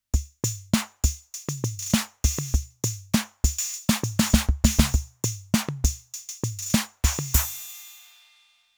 Beat

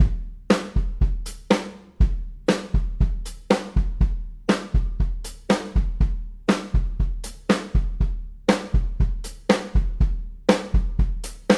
Drums Rev

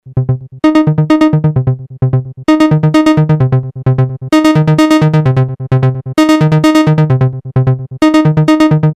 Square no Reso short Acc Decay

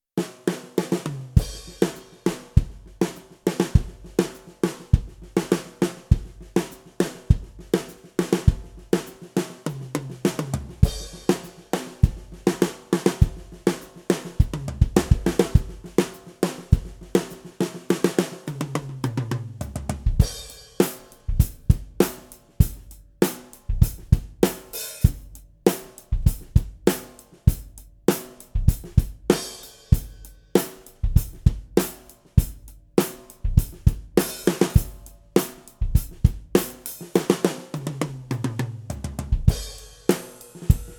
Mapex Tornado

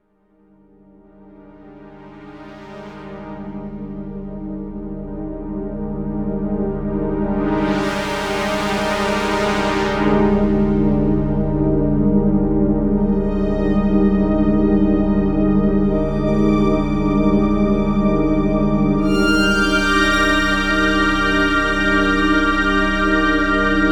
Prophet Strings

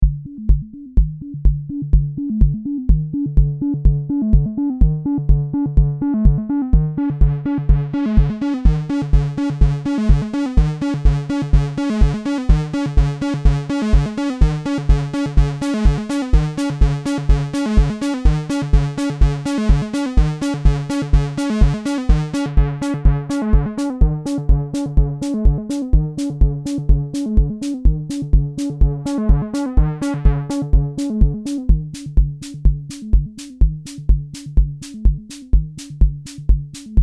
VCO 1 VCO 2; L.E.P. LEPLOOP V2

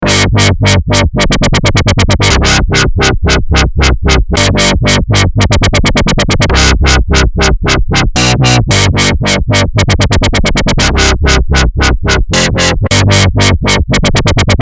Wobble; Fraenk

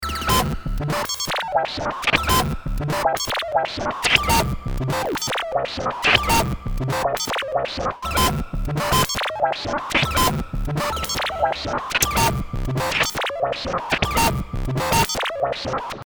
Snap Shot Sequencer; Waves Flow Motion

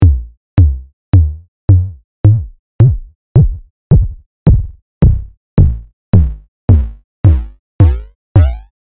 Drum LFO

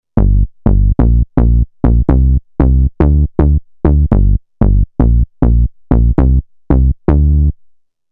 Bassline Thump

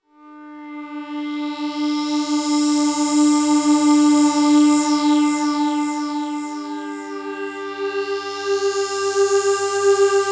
Modal Craft Synth 2.0

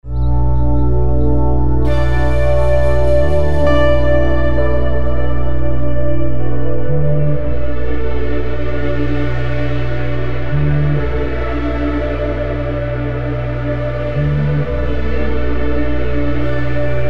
Avenger 2 Melodic Meditation; Vengeance Sound; Generative 1

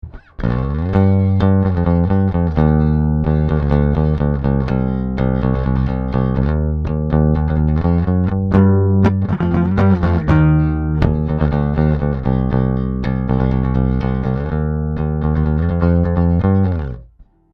Pick neutral; HB Block 800b

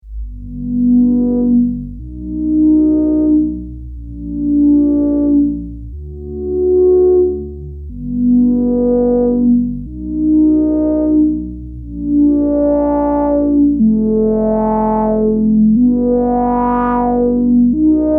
Moog Mother 32 Saw Noise Pulse

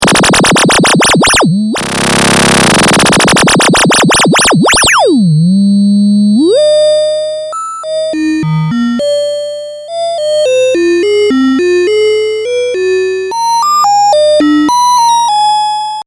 Filter FM VCO1 0-2khz

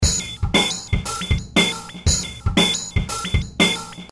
Loop BPM Sweep

